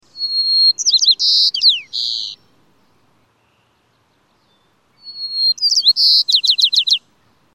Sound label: White crowned Sparrow